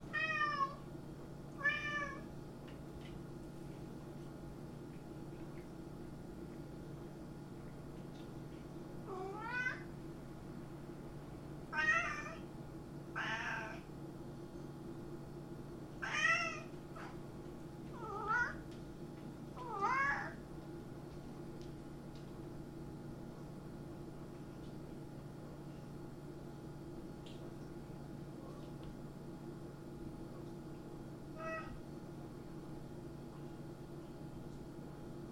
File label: kitchen cat meowing; myaukanya koshki